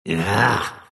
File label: monkey grunts; Dota 2; monkey king